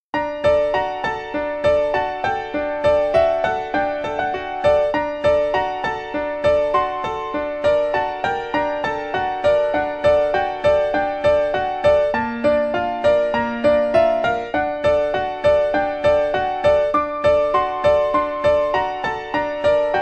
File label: Mixolydian b6 Melodic Minor